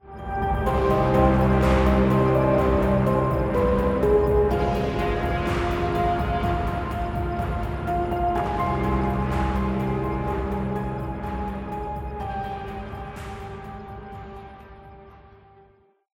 Logo; Desolate

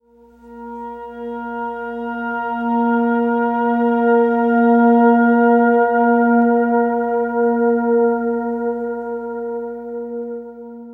symphonic; The Curse of Nordic Cove

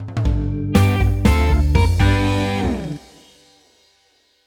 Success; The Werecleaner

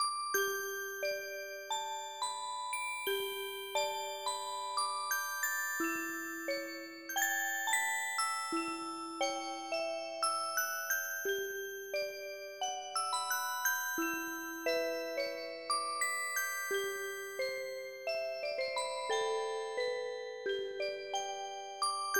Music Box; My Merry May; ps2

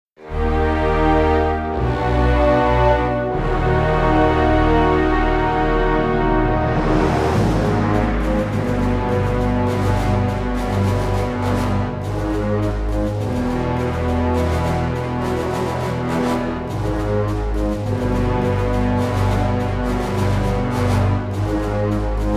Evil Foreboding March; rochard

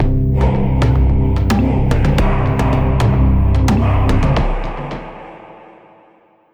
basicMood Cueva Bosque

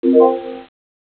Firt combo; quadrapop mobile gamerip 2005

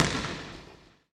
Ball Bounce Medium Regular Court

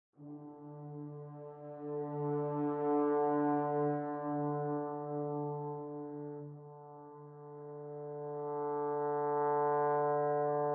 Camp Brass A; Torchlight III; PS4

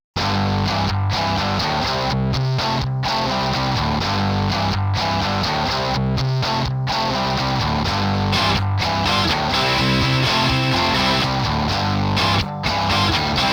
Music rock; Harley-Davidson Motorcycles: Race to the Rally

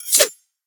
Use Knife

